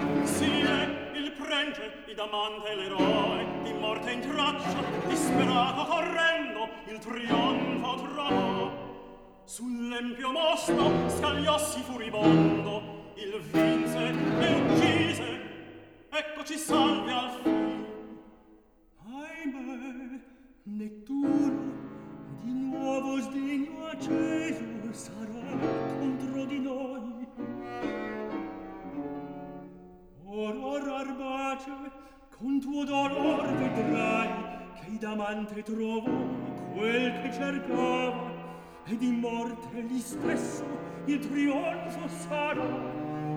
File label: opera, Recitativo